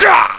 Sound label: pain